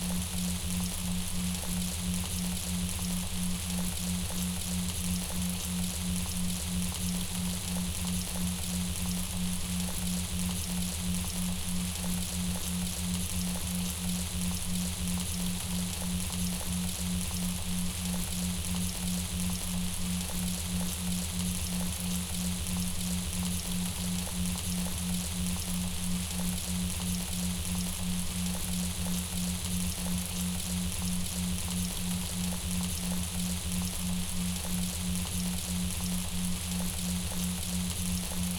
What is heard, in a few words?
Sleep Rain Memories, Binaural Beats Work Music